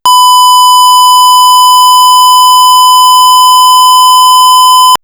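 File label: square wave 1khz test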